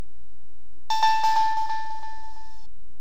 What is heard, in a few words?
startup; dsi